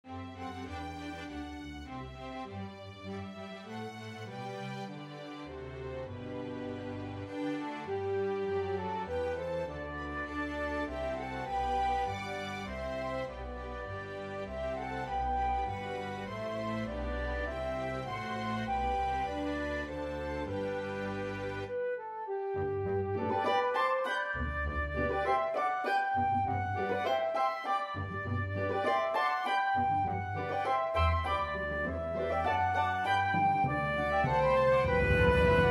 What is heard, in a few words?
Flute Track with Melody; The First Nowell